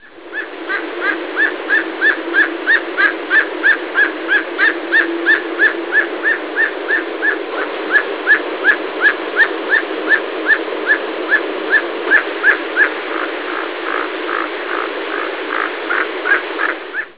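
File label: emerald tucan, birds on the Costa Maya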